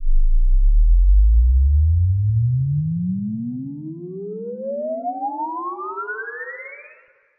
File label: Saucer Take Off